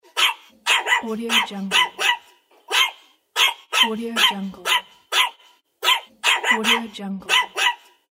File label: little dog barking